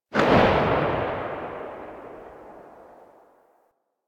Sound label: Glass Shotgun Tail B; Warframe; Tenno; Weapons